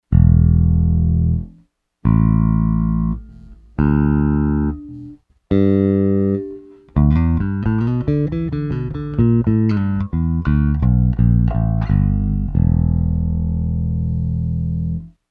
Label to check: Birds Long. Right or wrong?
Wrong